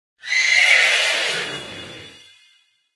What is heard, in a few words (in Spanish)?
Grito de Glastrier